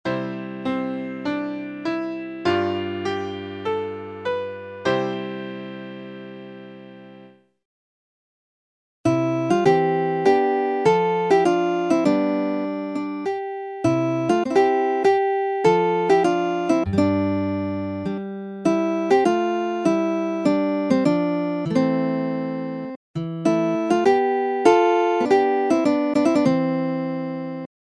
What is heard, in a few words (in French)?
majeur; Majeur tonique; Concert "Barzaz Breiz, la mémoire d'un peuple"